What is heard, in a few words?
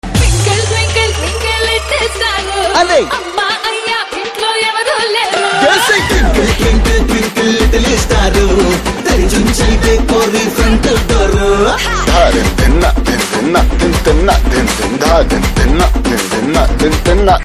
Twinkle Twinkle Little Star